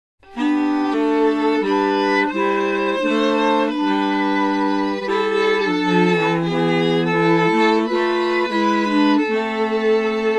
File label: Bach Chorale; SFPitch resyn